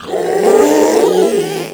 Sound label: Strike Scream; Warframe; Proto Infested; Ancient; Abilities